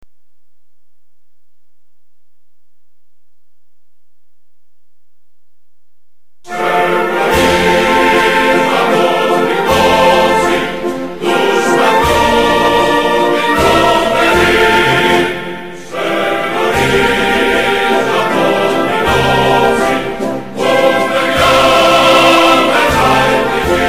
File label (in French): grece chant